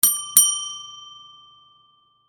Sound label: Bell Desk Twice, Office, Bell, Desk, Twice; Miscellaneous Office; Offices; BlastWave